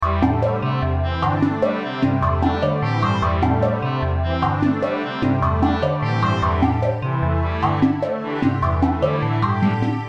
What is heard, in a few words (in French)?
musique technologie